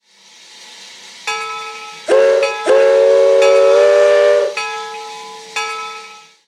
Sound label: Baldwin 3 Chime; RailPro